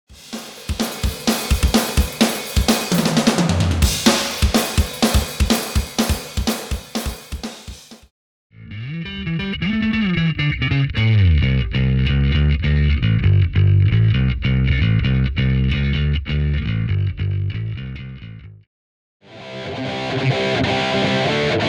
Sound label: STEMS